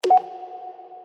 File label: target shot sound